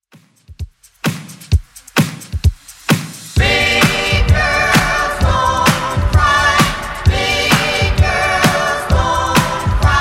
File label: 50s Redrum 130 bpm